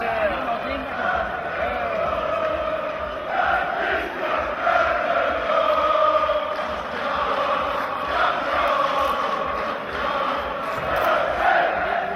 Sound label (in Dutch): hand in hand kameraden, chants